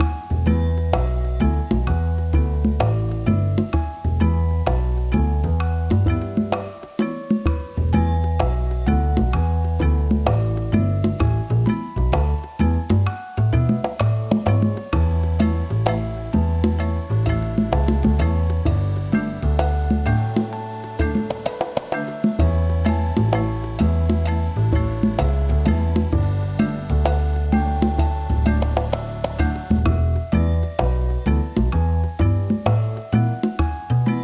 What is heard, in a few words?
Credits Ambient; Coco Loop credits; Fisher Price Ready for School Kindergarten